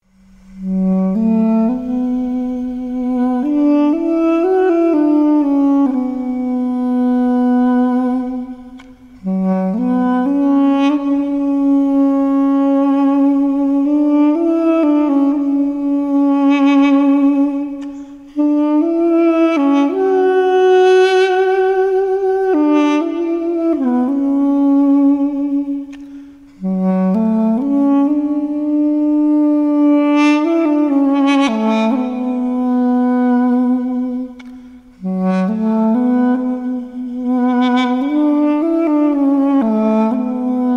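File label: sounds of a duduk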